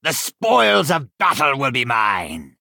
attack; helstrom footpad

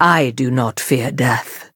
attack; dawn agent

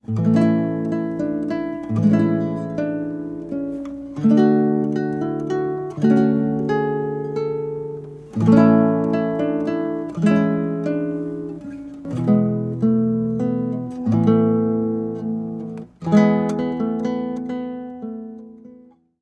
Lute Song

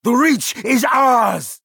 attack; forsworn looter

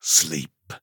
footpad attack; back-alley

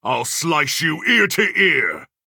champion attack; shornhelm

